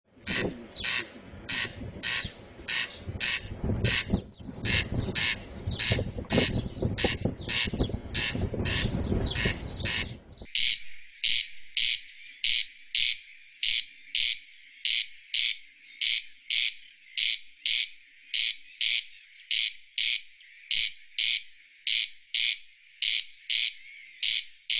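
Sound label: Corncrake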